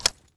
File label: bolt chek, weapons